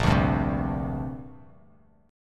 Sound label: A sharp 6th Add 9th; strummed; JGuitar